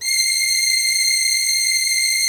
PAD, ATMO PADS; Best Service; XX Large

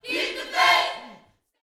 Vocal Gospel PHRASE

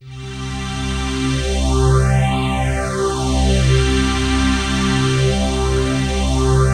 PHASE PAD, ATMO PADS; Best Service; XX Large

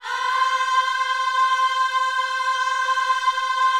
OHS C 5, gospel; Spectrasonics; Vocal Planet Vol.1 Gospel; akai

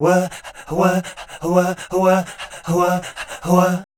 scat, voice

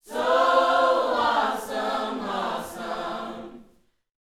YOUTH CH PHRASE, Vocal Planet Gospel

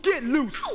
VOC

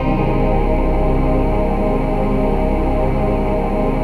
VOICE PAD; Best Service; XX Large; Pads

